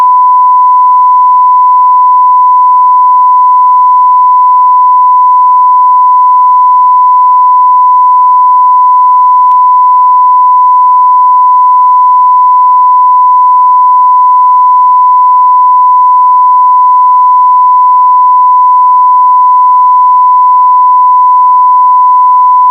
calibrazione 94db; magnetostrittivi

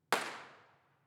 IR petardo; Teatro Benevento

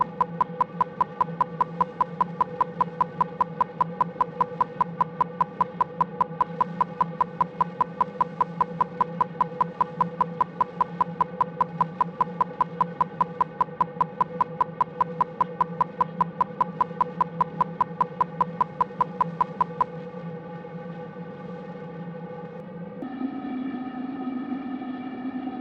burst 16ms; LaSpezia; CESI

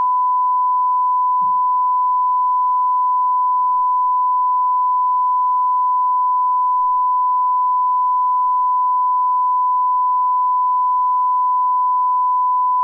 Calibrazione 94dB; Umik-2

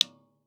ir magnetostrittivo, dml vetro; magnetostrittivi

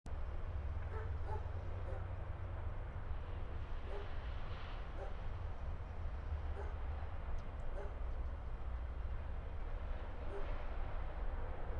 Treno; Eigenmike; mono 16bits; Nuova Strada; ComunePR

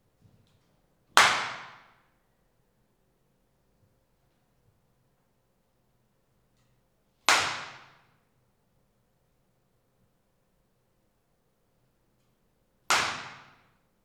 Clappatore Aula

